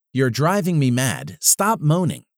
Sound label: moaning